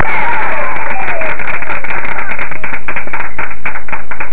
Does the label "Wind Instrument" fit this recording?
No